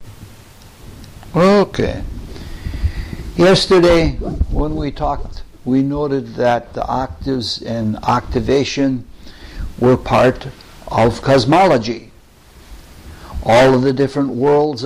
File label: Octaves